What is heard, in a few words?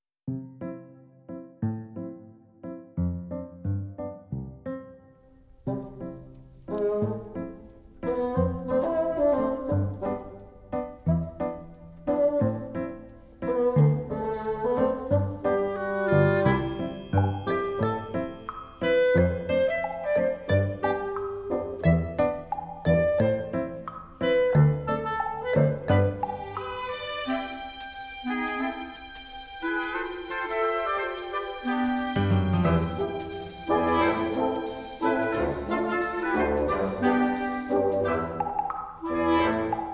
Comedy Instrumental